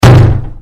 Boot Hit

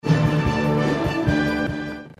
glory fanfare

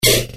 button

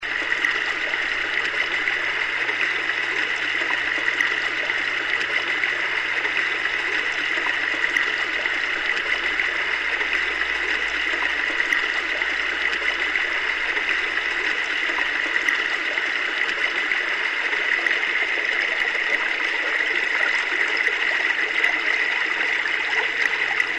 water fall

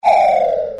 Fall

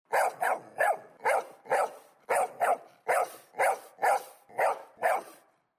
Dog Bark

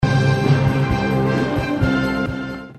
glory fanfare

